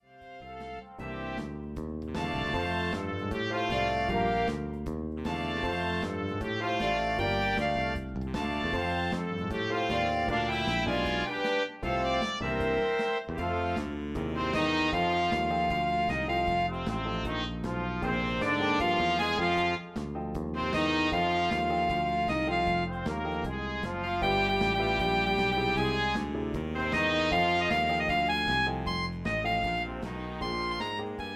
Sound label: jazz band